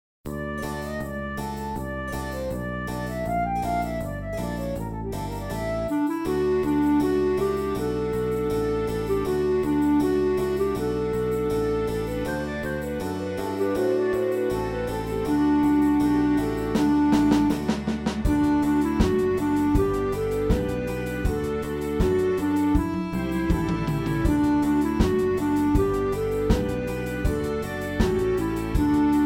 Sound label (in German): Kater instrumental; Der gestiefelte Kater